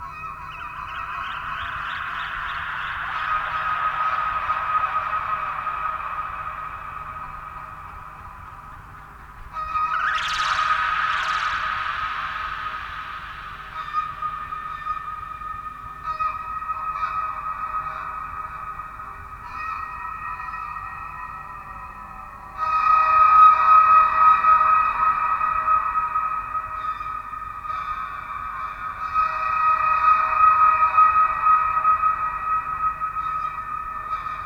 Angry chicken squawking with various NTS-1 filter, delay and reverb FX controlled by an Axoloti joystick